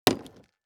Impact Debris Large Plastic Hit By Medium Metal Pipe; Impacts